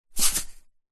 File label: household washing detergent power tip into washing machine detergent drawer; zapsplat